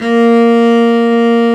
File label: cello, Arco Solo; String Master Series